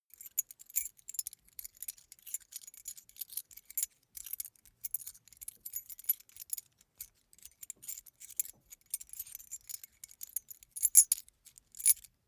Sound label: key ring small rattle sequence